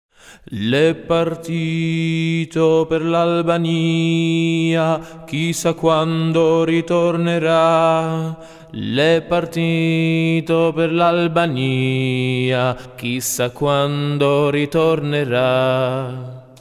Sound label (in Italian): bassi